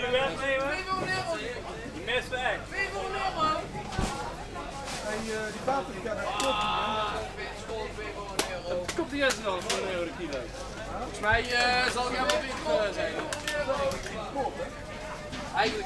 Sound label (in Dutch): Markt; Helmond